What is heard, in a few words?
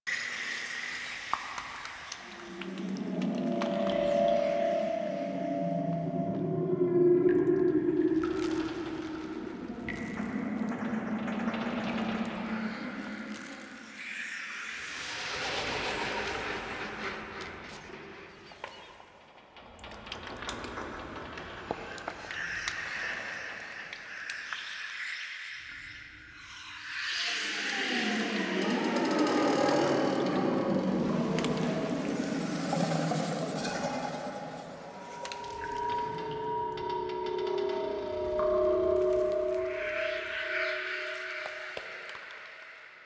Exterior Hub Loop, Ambience; Warframe; Infested Eris; Amb Loops Exterior